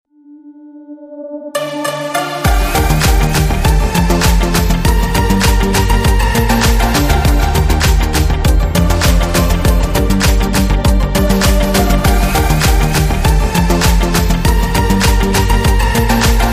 solar beam

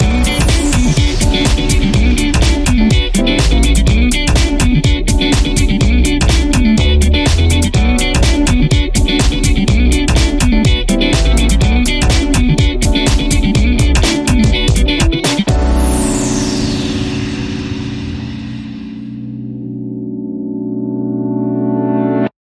Future Funky House Sounds Mix

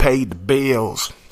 Rap Vox

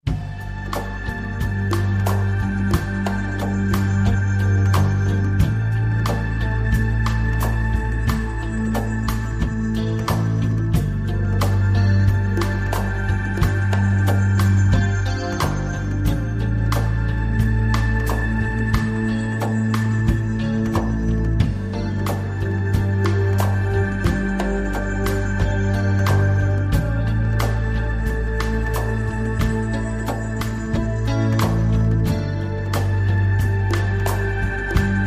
latin romance

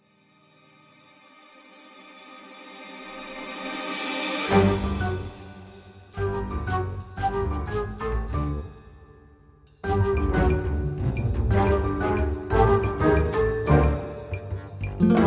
latin whimsical

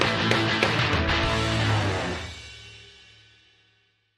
stinger; green screamer

